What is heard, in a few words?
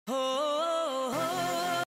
woooaaaoooaaa